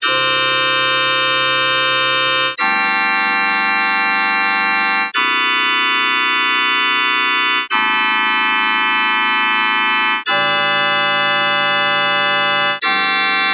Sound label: Microtonal Chord Progression